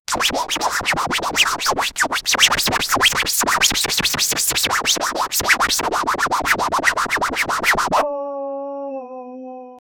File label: dj scratch